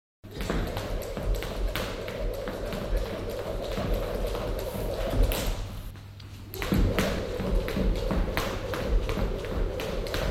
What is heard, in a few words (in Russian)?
Skakalka prygajut